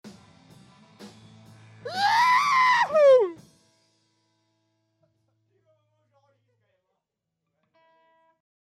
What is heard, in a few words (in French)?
Cri viril